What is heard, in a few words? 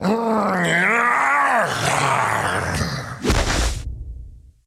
Giant Death